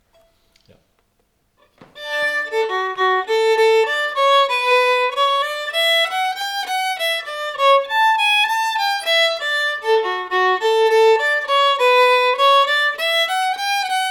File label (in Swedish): Torekovspolskan